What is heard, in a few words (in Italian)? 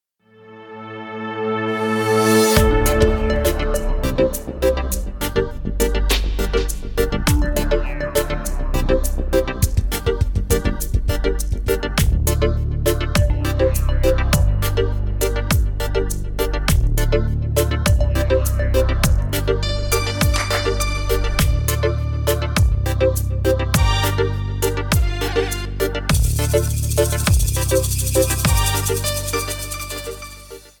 reggaeton, base